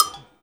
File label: COWBELL, Percussion; InVision Interactive; Percussion Slam